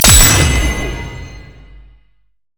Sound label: pick a card gold card; LoL; twistedfate